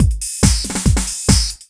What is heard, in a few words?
Beat 140; Audacity; loops